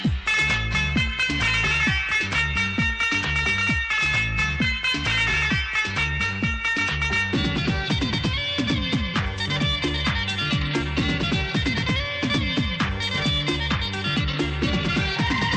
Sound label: Instrumental Ringtone